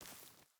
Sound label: footstep barefoot grass walk; Rust